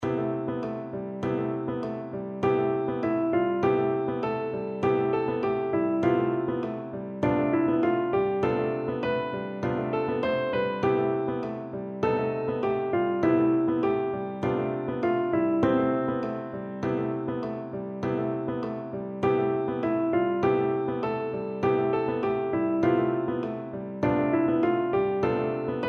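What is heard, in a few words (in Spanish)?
entonar con acompañamiento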